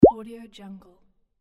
bubble sound

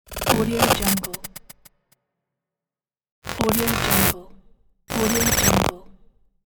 glitch intro

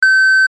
single tone interface beep; sound ideas

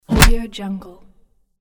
punch

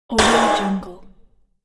single robotic clang tech

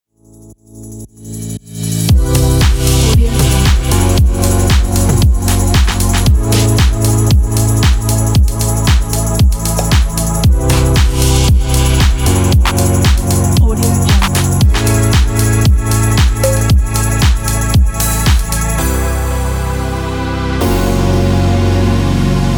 stylish modern logo ident

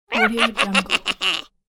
cartoon male bad jeer laugh; fxprosound

